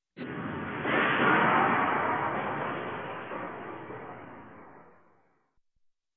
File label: Building blow up distant